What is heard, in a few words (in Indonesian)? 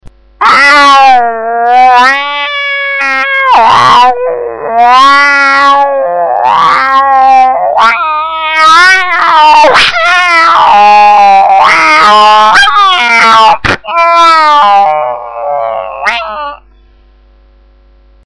kucing kawin